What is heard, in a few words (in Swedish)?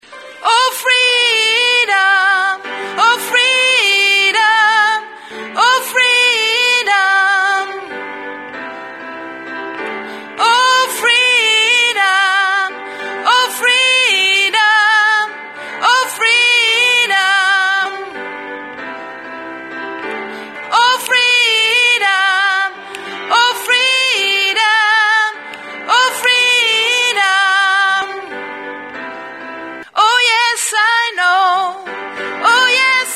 sopran